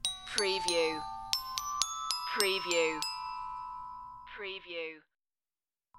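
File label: Xylophone Melody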